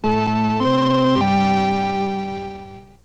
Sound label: news sounder; WJMA